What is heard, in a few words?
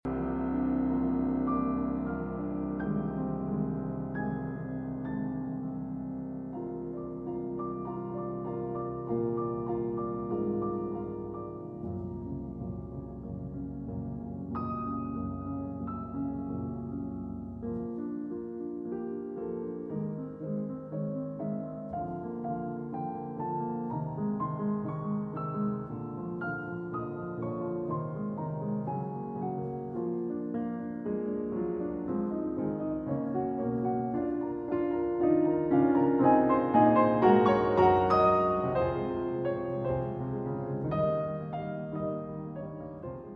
Piano Duet; La Belle et la Bête; IX. La Métamorphose